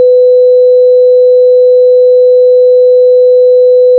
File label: sine 500Hz 1dB + sine 4kHz 41dB